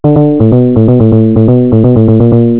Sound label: tune